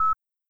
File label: SFX Enviro Beep; this is the zodiac speaking 2020 gamerip switch ps4 xbox one pc macos